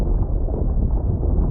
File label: Burrowing Rumble Loud